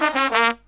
Killer Brass Riffs

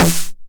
909 SD Tape Sat

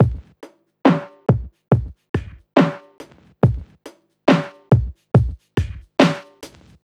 SloMo Beats, Loops 140 BPM

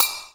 BELL, JAPANESE

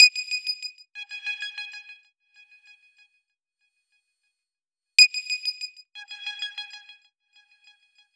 SYNT, funk

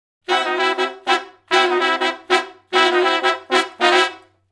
Funk Riff (D) har, Horncraft for R&B; Sections; Sonic Foundry (Sony Creative Software); Crimson Blue and Fabulous Horncraft 4 RnB

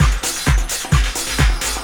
130 tribal, LOOP PERC TRIBAL

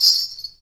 PRC METAL, Afro Shakers, Ankle Pods; Roland LCDP14 Africa VOL-2

